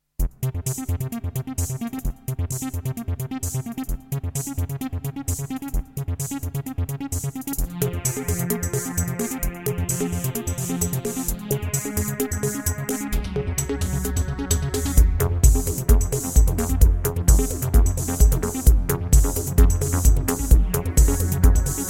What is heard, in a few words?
Midiplus MiniEngine USB; Descent - Level 1